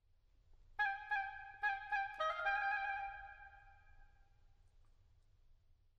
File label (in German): zaunkoenigmotiv oboe